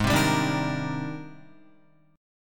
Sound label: G sharp Major Sharp 11th, Guitar; strummed; JGuitar